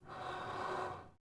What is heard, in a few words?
Gasmask Inhale; Sid Meier's Civilization 4 Complete; Beyond the Sword; Units